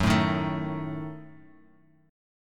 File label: D sharp Diminished F sharp, chord